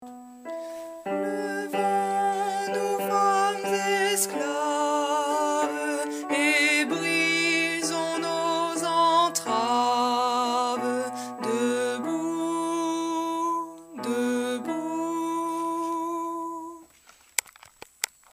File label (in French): hymne hommes